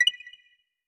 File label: btn; bookworld